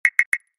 Knock

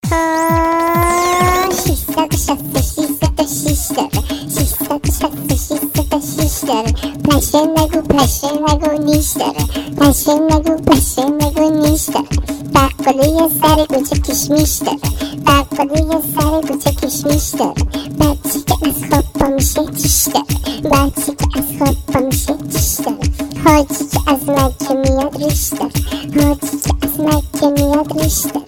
Persian Song

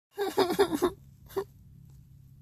Fake Cry

